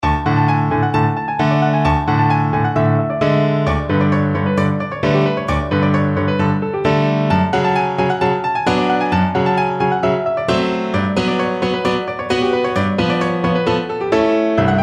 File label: romanian folkdance, piano; bartok